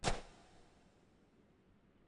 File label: Footstep Handler Sand